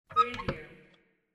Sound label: cabinet door open